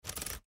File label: Hanger Slide, Hanger Slide Stutter; Household